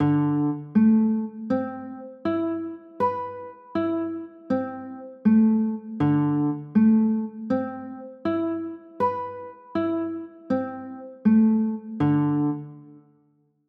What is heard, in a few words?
d 13; chords